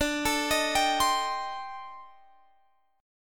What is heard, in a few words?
A-Minor 7th Flat 5th D, chord; Am7b5/D; arpeggiated